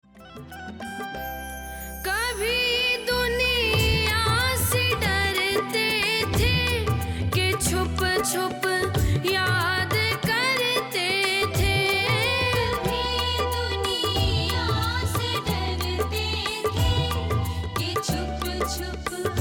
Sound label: Trending Bhajan